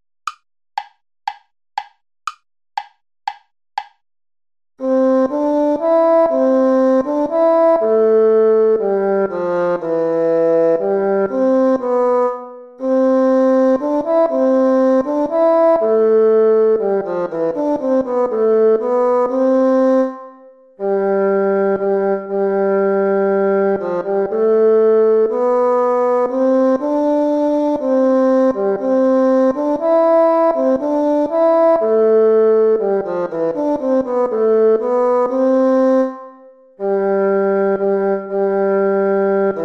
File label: ode joy play along; beethoven ludwig van